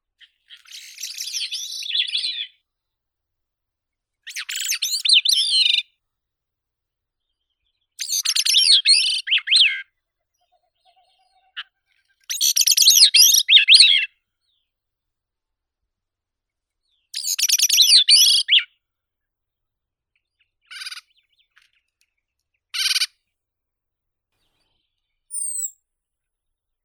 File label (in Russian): drozd malii catharus minimus